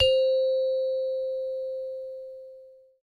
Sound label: Bell; Turok